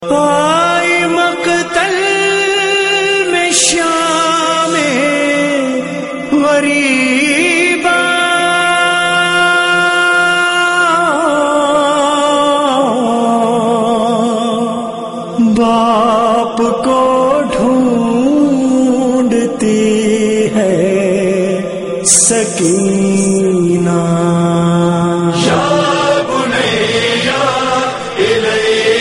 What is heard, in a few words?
noha tone